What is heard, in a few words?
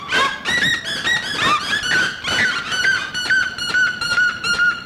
Cariama cristata